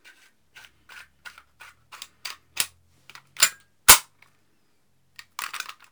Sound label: Gun Handling Weapon, Weapons Foley; SFX Kit